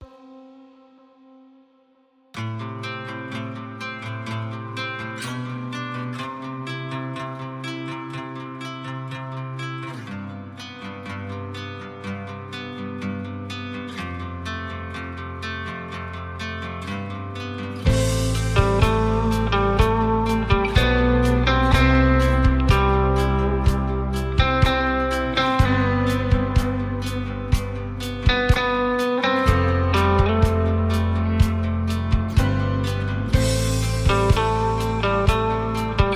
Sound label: Instrumental